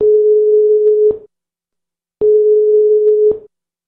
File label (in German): wartezeichen